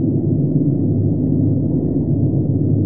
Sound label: eng; ns enceladus